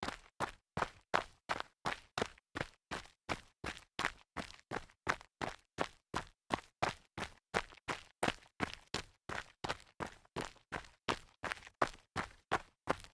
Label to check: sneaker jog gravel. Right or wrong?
Right